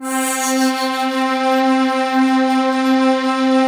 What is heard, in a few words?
pad, 162 BPM, Drum & Bass; Ueberschall; Drum & Bass Resonance